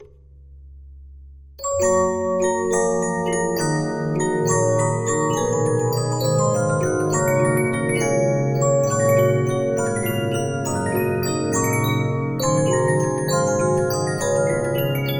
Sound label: Regina, 15inch disc musicbox